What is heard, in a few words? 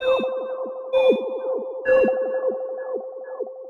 Sci-Fi Radio 130BPM